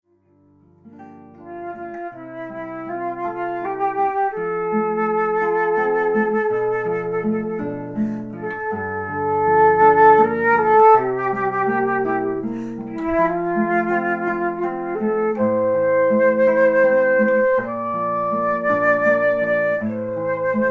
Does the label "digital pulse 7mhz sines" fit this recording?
No